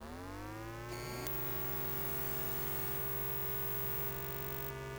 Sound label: tech oneshot; ambient; Counter-Strike Global Offensive; office